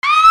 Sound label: ALARMS